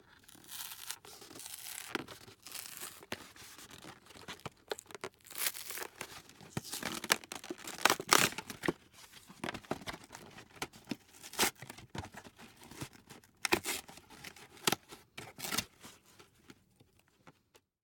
Packaging & Wrappers; TheWorkRoom Audio Post

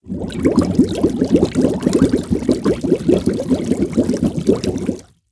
Elemental - Water Bubbles Big

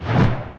whoosh, strider; hl2

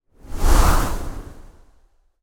MAGIC AIR Large Whoosh, Swirl, Wind Gust, Foliage; Articulated Sounds; Magic Elements vol.1

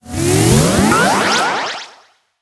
passive gain life - sound, Retro Game SFX

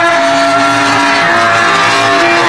metal grind; hl2

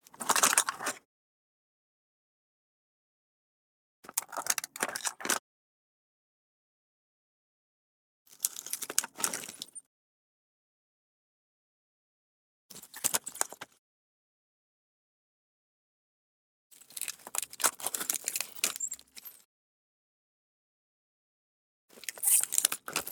SQUEAKS AND CREAKS Ice Cube Tray Squeaks; Squeaks and Creaks v2

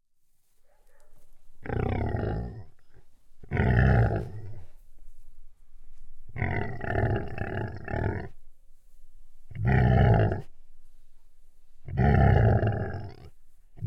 Pig Snort; Coll Anderson

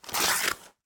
Tearing, Paper; Sounds Hunter; Paper Sound Effects

